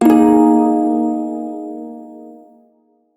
Shawzin Yo Chord; Warframe; Prime Shawzin

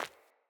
Sandals Run Default, Footsteps

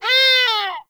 Forest Animal Cries; Warframe; Grineer Forest

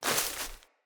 grass step; Sprout Farmer

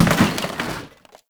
Janitor Cart Impact; Warframe; Ambience; NineteenNinetyNine

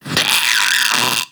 Vocal Pain, Infested; Splitter Split Top; Grey Strain

